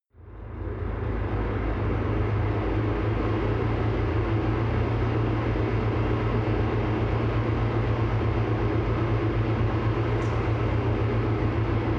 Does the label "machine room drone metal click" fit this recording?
Yes